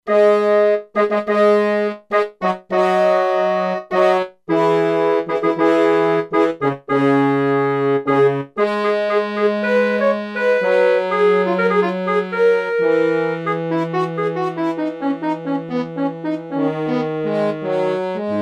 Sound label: Wind Stack; Factory Presets; E-mu Proteus-2